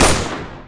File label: shoot; selfpreserve; tf2classic